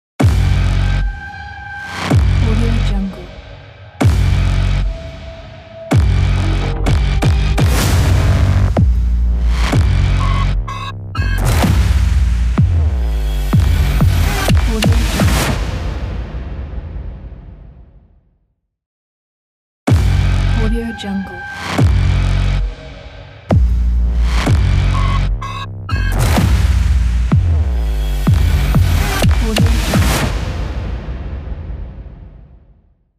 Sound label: aggressive hybrid action logo